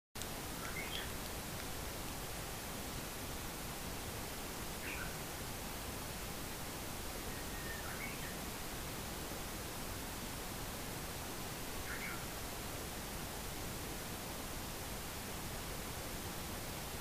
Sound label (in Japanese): uguisu